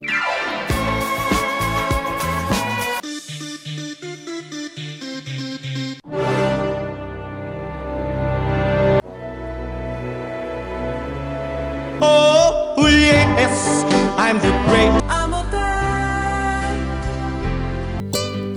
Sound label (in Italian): Sarabanda